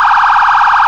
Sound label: ambulance warning; gmod; perp2.5